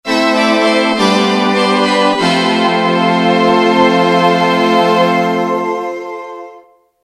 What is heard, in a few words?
Syn string